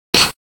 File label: Factory noise; gcompris; memory sound